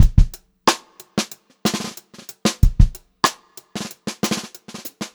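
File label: groovy variation, Funk-Groove Drumloops; USB Soundscan vol.38; AKAI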